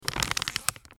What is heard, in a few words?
zipper